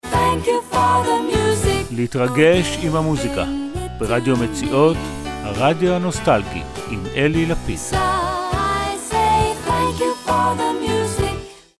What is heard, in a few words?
Jingle